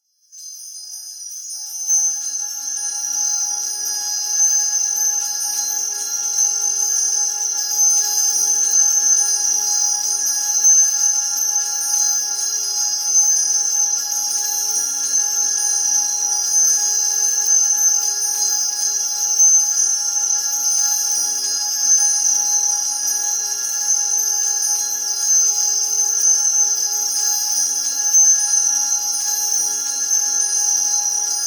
drone high chimes

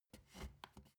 step ladder wood scrape single; schritte

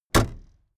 car camaro close trunklid

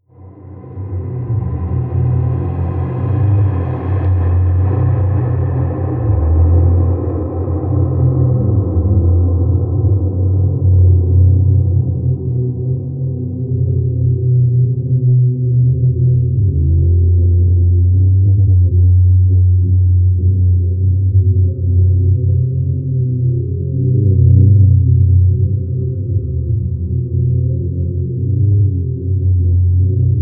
drone splice

